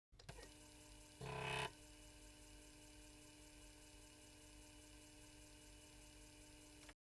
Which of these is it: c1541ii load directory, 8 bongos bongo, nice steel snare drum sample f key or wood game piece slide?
c1541ii load directory